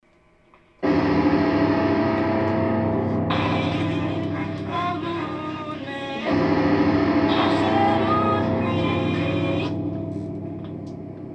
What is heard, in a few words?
Evening Drums